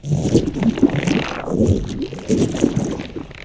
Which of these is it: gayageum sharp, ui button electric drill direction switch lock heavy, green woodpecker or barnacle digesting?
barnacle digesting